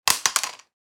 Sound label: Headphones falling on surface soft impact; headphones and speakers